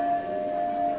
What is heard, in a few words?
no crying